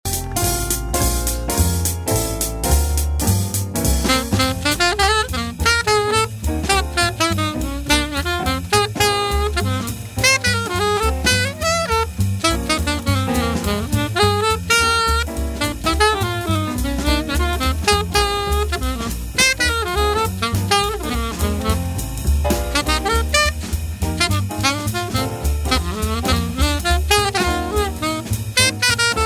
vintage king 613 alto saxophone sml paris hard rubber mpc vandoren paris red box